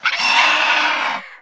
Nesting Roc Play

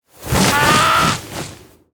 Attack Underlay; Kragg

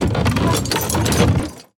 Cart Play Underlay

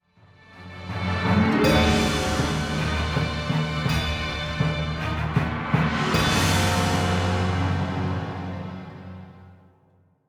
Play Stinger; Galakrond Tier2

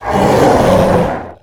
Jungle Moonkin, Death

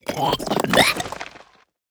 Stone Elemental Attack

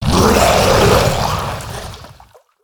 Walking Fountain Play

